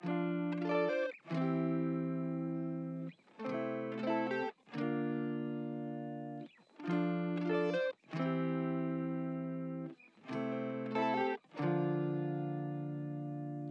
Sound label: 140 BPM D Min Lead; Grapefruit